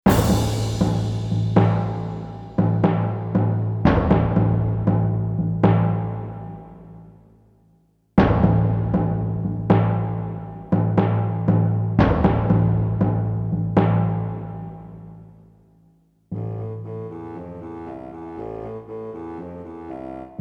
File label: Fight; Pool of Radiance; Ruins of Myth Drannor